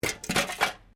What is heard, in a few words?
Can Softdrink Rubbish Move